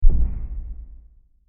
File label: Small Deep Stab; Low Impacts